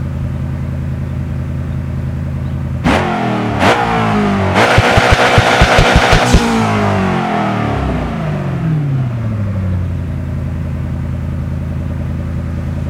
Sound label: idle, koenigsegg agera; sgmcars